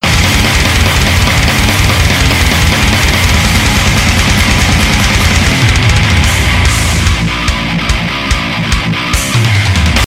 intro music; true ruler

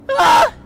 teleport; freak fortress 2

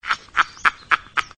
teleport; freak fortress 2; hentai woody

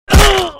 hit; freak fortress 2; super pro demo

